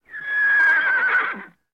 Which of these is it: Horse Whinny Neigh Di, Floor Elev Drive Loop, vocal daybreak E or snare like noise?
Horse Whinny Neigh Di